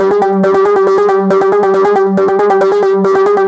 G 138 BPM, Psytrance Arp Loops; Nova

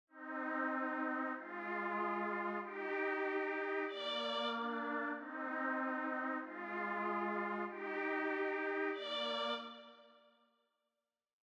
Intro - 95 BPM D# Maj Pad, Hip Hop; Melody Loops; Loop Stems; Cymatics

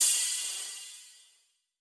Cymbal; Drums; Drum One Shots; Cymatics; Goner

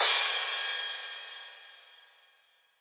Cymbal, Hip Hop and Trap; Ghosthack; Hip Hop and Trap Freebie

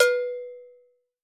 Cowbell - B; Percussion; Cymatics - Infinity Beta Pack 2.0; Zeus Percussion Collection

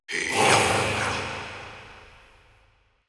Processed Battle Shouts, Viking Vocal

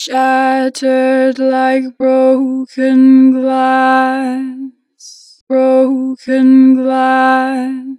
Natural Vibrato, Vocals, 4 bar vib; Shattered; Harmor Vocals

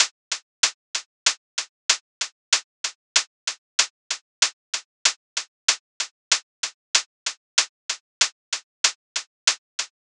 Hihat Loop - 95 BPM; Tuscan; Cymatics; ANALOG EVOLUTION Beta; Hihat Loops

